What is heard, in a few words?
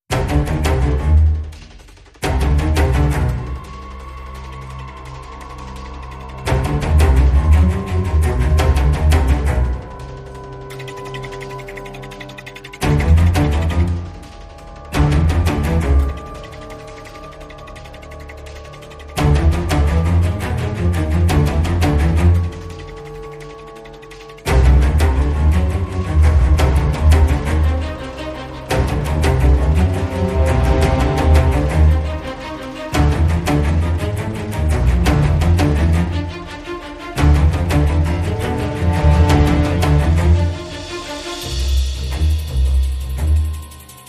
No Perc Fills